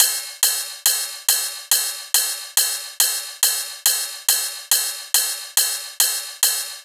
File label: drum Loop 140BPM Ride